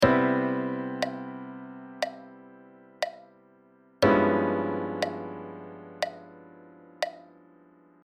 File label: Cmaj7,G7b5,b9,13 ch.; Chordscale exercises